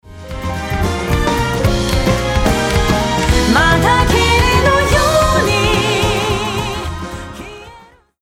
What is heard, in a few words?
Bass Auto Off